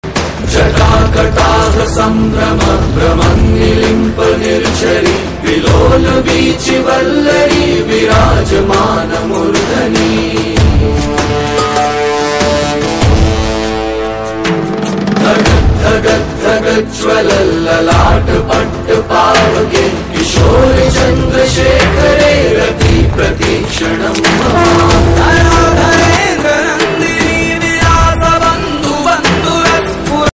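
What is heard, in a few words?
South background music Ringtone tamil ringtone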